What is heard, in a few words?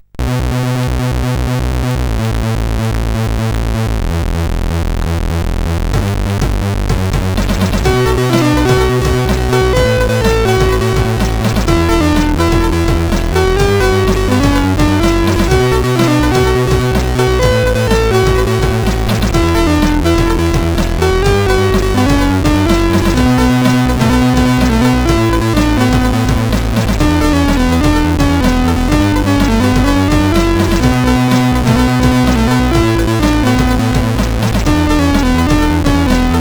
Old Micro Digi, sid CSG8580R5